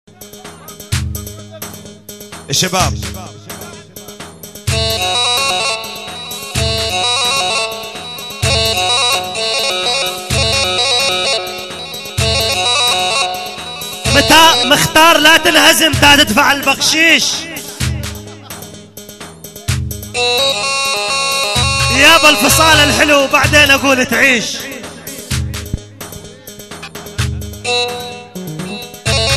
Live Arabic Dabke

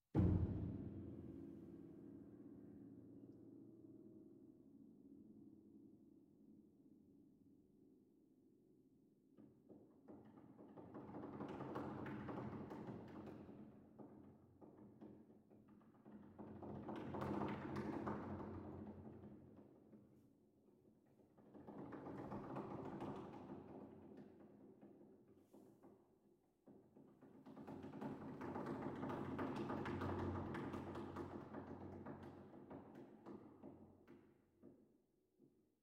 For Piano, Clarinet, Trombone & Cello

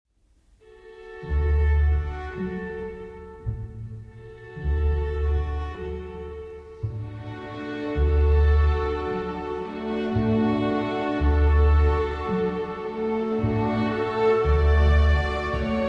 String Orchestra; 5 Lieder, Op. 49 No. 4, Wiegenlied; Brahms

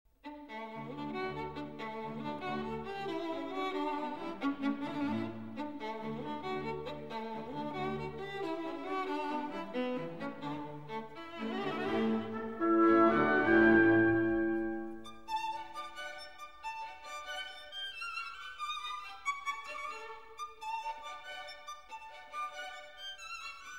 Concerto in D Major for Violin and Orchestra

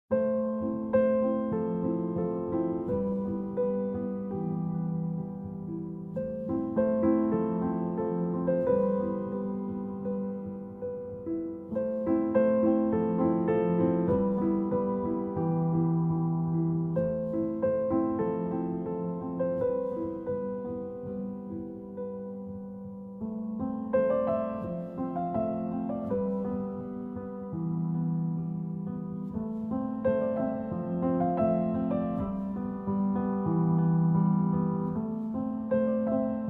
Solo Piano

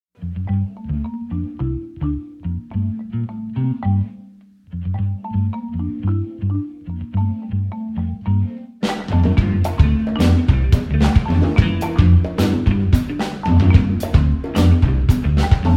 Drone

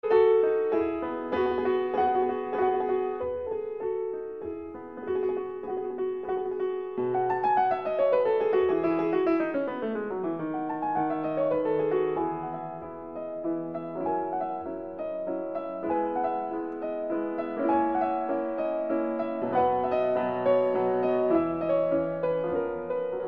Keyboard Sonata in E Major

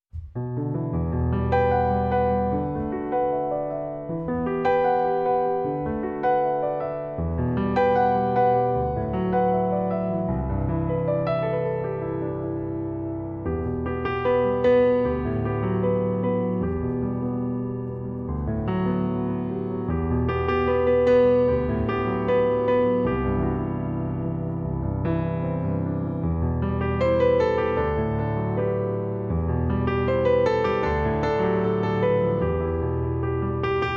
Piano Version

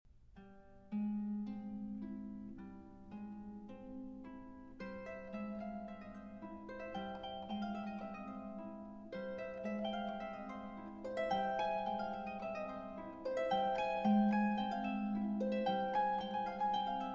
Suite For Harp, Op. 270 II. Allegretto; Hovhaness

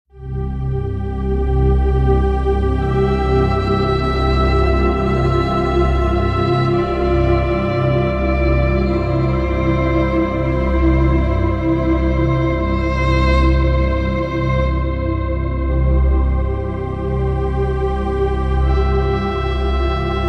528 Hz Deep Relaxation

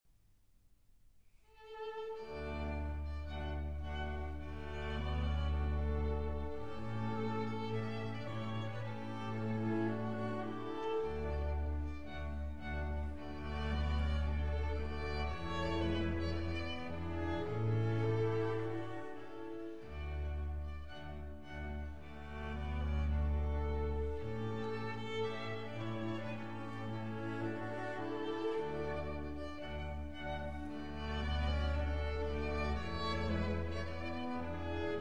Piano Quintet in A Major, Tema Con Variazioni; D. 667; Trout Quintet

